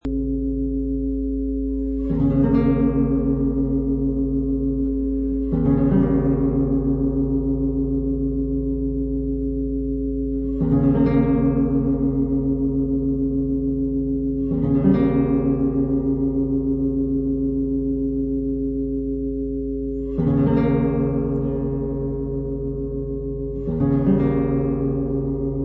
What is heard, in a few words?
Chords